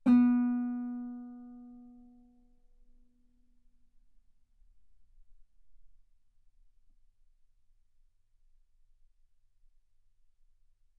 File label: Guitar B3; ff; sul B